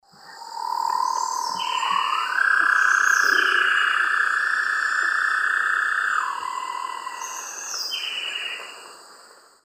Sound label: Philydor pyrrhodes, song; S. America; Eastern Lowlands